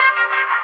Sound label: horns, jazz loops